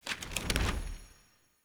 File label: UI Default Map Open